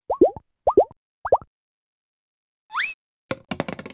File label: Sfx Toy; Charlotte's Web: Wilbur and Friends